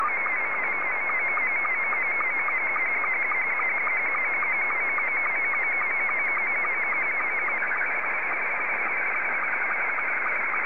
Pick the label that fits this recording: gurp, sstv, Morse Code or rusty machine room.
sstv